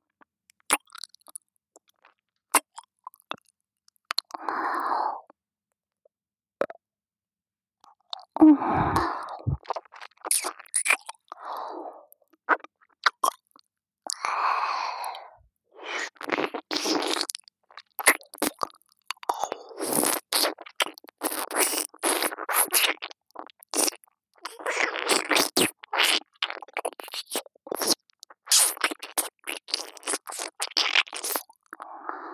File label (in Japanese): 右耳舐め (ループ); 無知生徒の右耳舐め